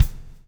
BLUE FB, BLUES; Spectrasonics - Backbeat

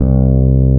BASS, Drum & Bass, 150 BPM; Ueberschall; Drum & Bass Flash Trackz

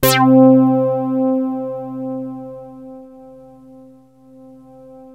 BASS FORM; MORPHEUS; Syntec; Wall of Sounds

